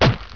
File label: STAB, 碰撞声音素材